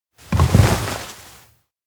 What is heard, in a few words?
sit coffee counter; anemoi